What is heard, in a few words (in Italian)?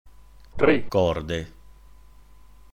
tre corde